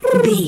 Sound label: pigeon call